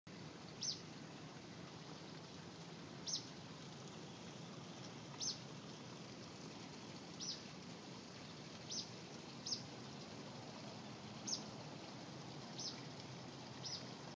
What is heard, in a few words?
empid